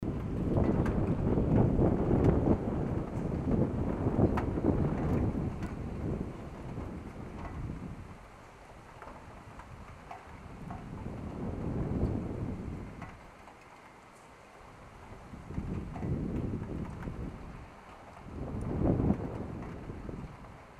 zvuk dozhdja

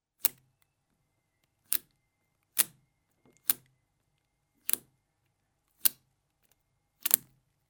prilipanie

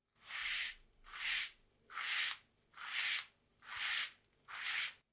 zvuk metly

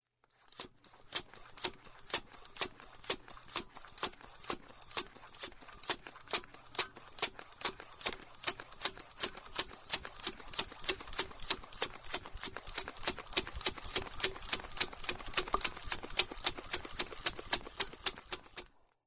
zvuki shinomontazha

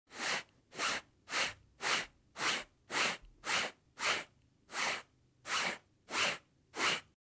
zvuk gubki

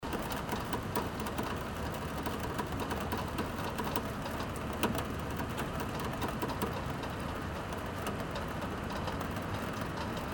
zvuk dozhdja

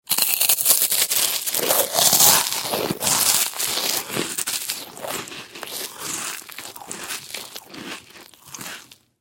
hrust suharej

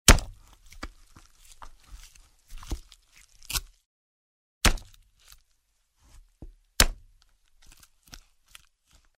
zhutkie zvuki

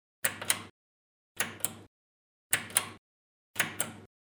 zvuk knopki

